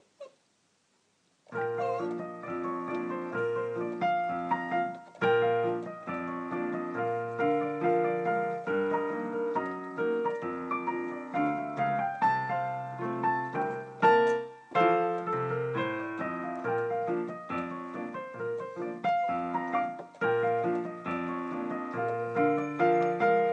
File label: pineapple rag practice